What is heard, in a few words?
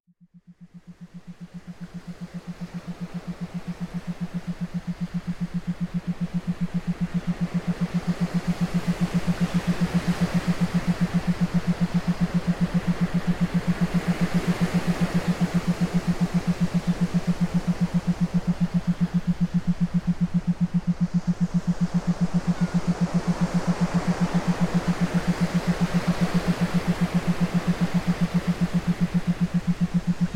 Binaural Beats Theta Meditation Waves